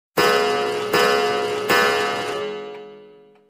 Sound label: gong